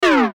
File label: button descend; Multimedia; Buttons